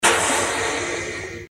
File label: short industrial event